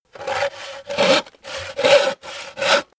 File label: hand saw wood